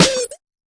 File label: fx drum; Electro FX